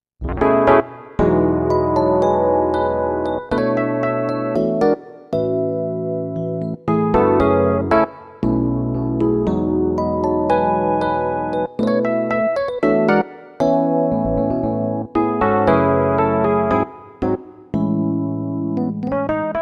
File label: Electric Piano; YAMYDP113